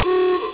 Honk

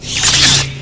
borg personal shield; Various Borg Sounds

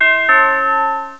doorbell

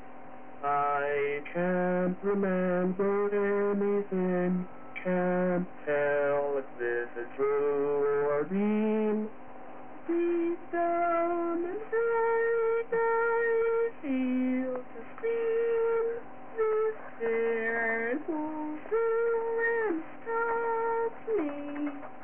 sing

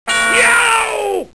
yeow, Thud! YEOW!!!!!; Flintstones

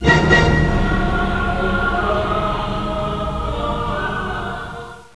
borg dramatic cue when encountered

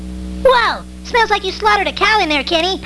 cow

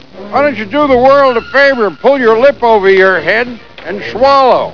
swallow

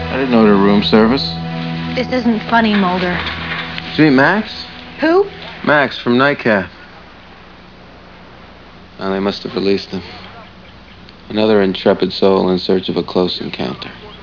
close

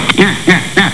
nyuknyuk

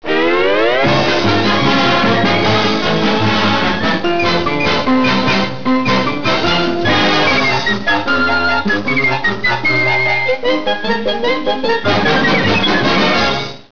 LOON TOON

